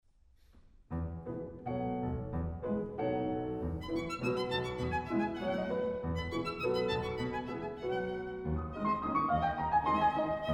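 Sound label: violin sonata; franck r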